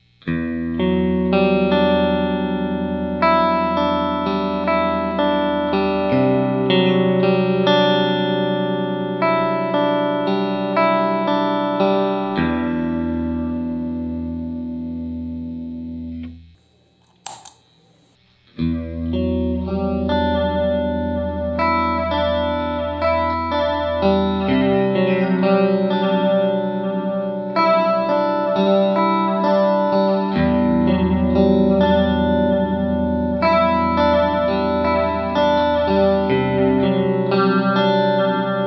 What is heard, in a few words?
Cycle Chorus